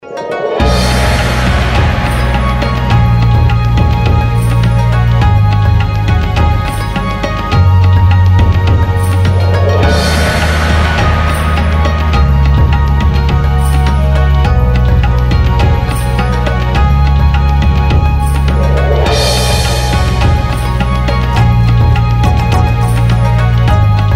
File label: bgm tansaku